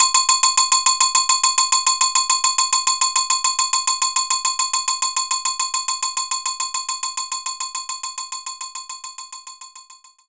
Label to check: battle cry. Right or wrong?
Wrong